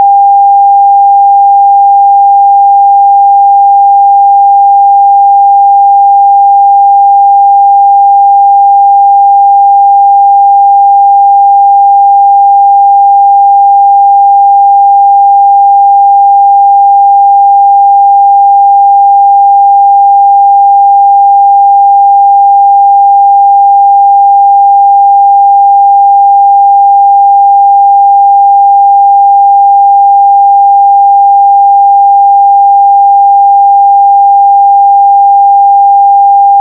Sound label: sine 800